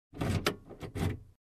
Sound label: Car door lock